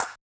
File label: Yamaha DJX 2B, Claps Bb2